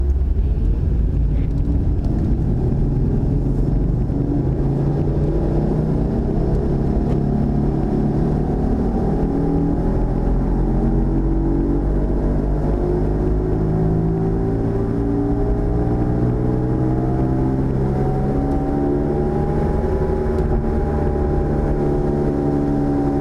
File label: rl drivetrain sound